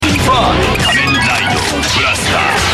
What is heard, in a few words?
Blaster; Dcd-faiz